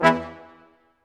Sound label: BRS Quintet stac; Roland L-CD702